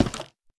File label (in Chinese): 下界木门开关声